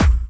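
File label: snare drum bass drum, Dance Mega Drums; Best Service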